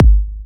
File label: Bassdrum